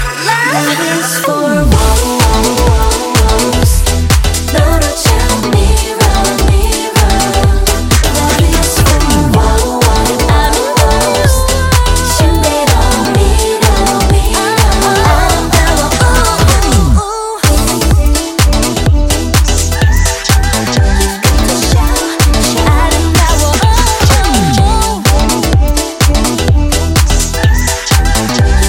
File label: Instrumental